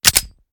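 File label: pistol reload empty charge; gmod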